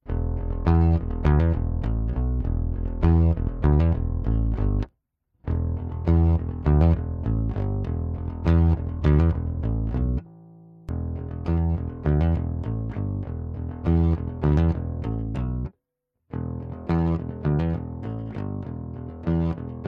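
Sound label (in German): Bass Rickenbacker DI Signal Alle Highpass Frequenzen Durch Leicht angezerrt; LilFrEQ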